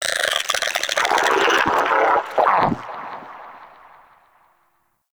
Shrimp Lantern Vocal Angry, Creatures; Baliq

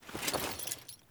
Foley Walk; Jotar; Friendly

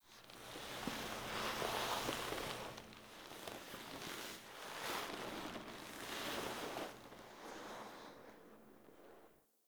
Foley Pick Up; Cinematics; Operator; Warframe; Orbiter Cradle

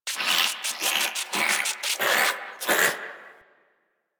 Landscape Close Critter, Ambience; Warframe; Venus Open World; One Offs

